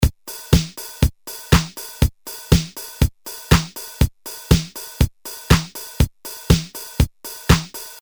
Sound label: Disco, Boss DR-220A Preset Patterns